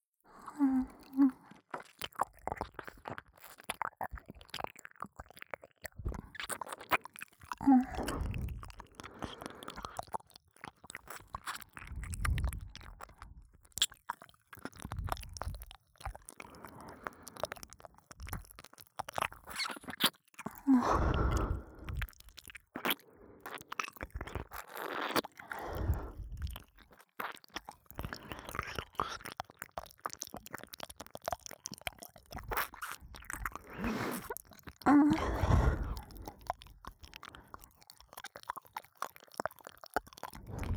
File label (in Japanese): 右耳舐め; アドリブ集; 地雷系ヤンデレ彼女の重すぎる求愛行動～妊娠するまで終わらない拘束中出しセックス～